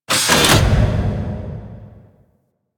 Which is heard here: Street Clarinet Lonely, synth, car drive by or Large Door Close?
Large Door Close